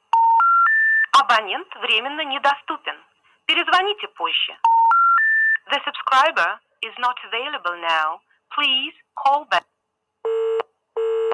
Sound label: telephonn robot